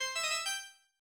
Comical Organ

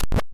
SFX Spear

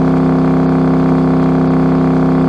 Engine Muscle Rpm High; boom karts multiplayer kart racing android

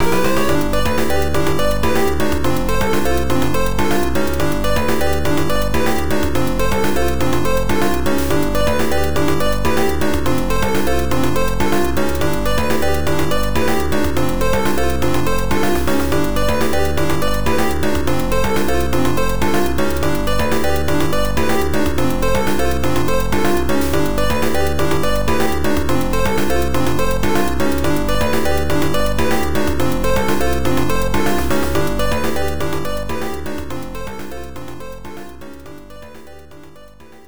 General Purpose - Samba Loop

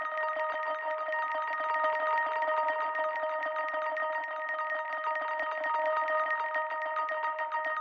Plink Drone

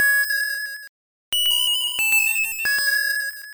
Sing; Pokemon; Gen 1; attack moves; RBY

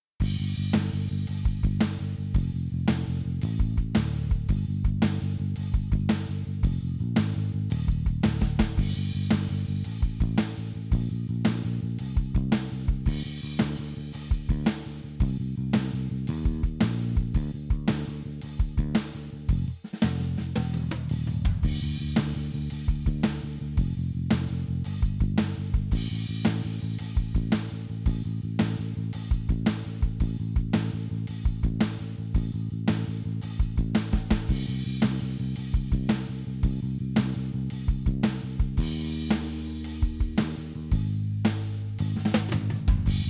BGM rhythm; game builder garage 2021 switch; Node 05

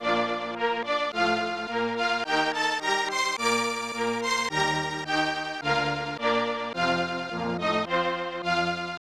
National Anthem of Northern Ireland

